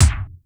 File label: SMASH K; Crypton - DrumBase5000; R70 KIT #2